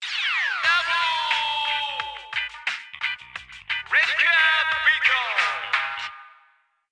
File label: Radio Jingle